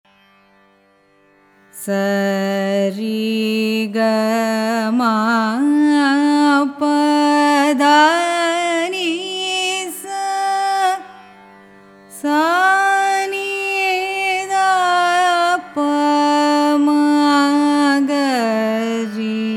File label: raga neethimathi arohanam avarohanam, carnatic music